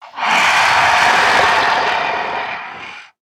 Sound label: alert, zomb female; nmr